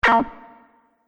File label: Multimedia Button; Andrew Fleming